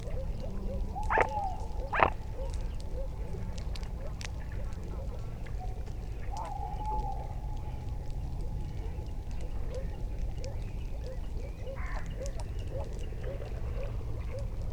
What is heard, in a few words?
Mute swan; قوی گنگ